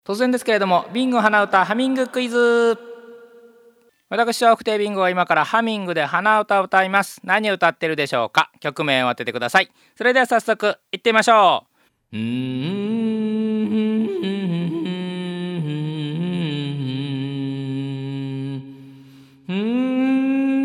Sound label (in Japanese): hanauta